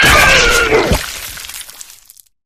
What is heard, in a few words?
flesh destroyed large; flood